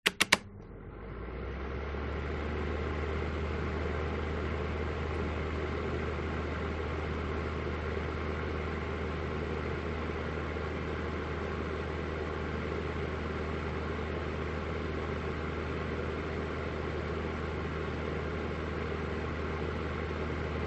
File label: household heater fan small on run off above heater; zapsplat